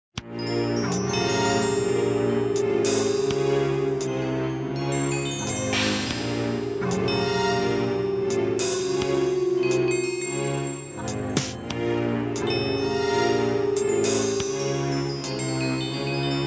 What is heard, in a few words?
Music, Security Area